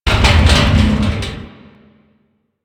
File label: Metal Barrel Slide; Warframe; Ambience; Nineteen Ninety Nine